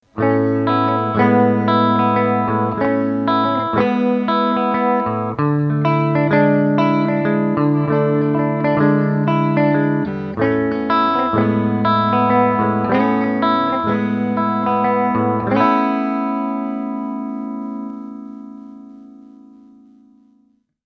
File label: bn clean